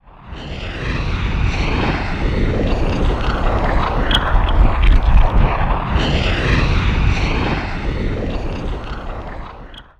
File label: SUCK ENERGY TARGET